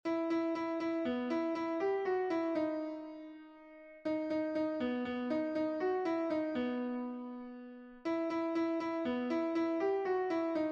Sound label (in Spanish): contralto, himnos en piano; 52 DIOS HA HECHO TODO; cantos del camino